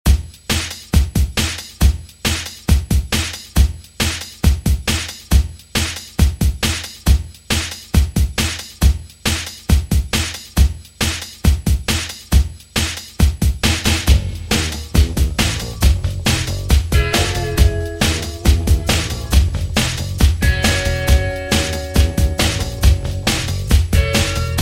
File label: 80s Dj Intro Outro 137 Bpm